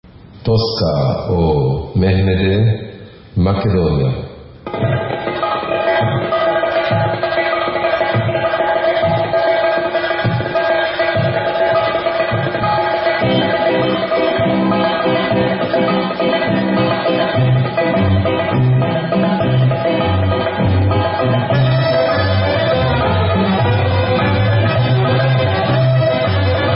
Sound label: Macedonian Instrumental; Mehmede Tosca